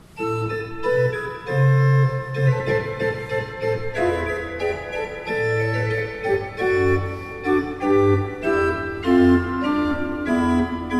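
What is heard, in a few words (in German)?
Kreienbrink, pfeifenorgeln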